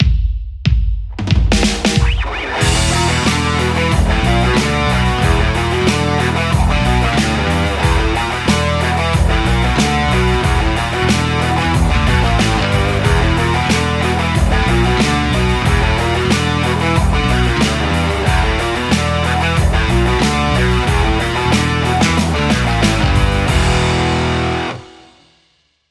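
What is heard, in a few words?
Stratocaster; rcvprod amplistortion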